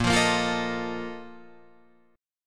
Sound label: Bb Major 7th Suspended 4th, Guitar, Drop D